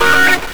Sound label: RIFF GTR, GUITARS KIT; Big Beat Samples & More; AKAI